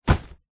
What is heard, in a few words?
foley tent in bag large heavy set down; zapsplat